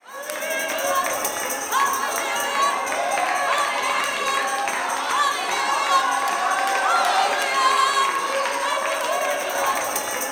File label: WORSHIP, Gospel; Spectrasonics; Vocal Planet Vol.1 Gospel